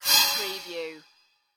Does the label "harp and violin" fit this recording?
No